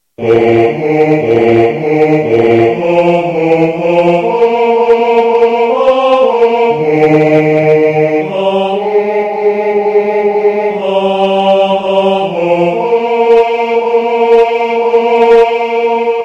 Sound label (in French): fanfare basses; voix 2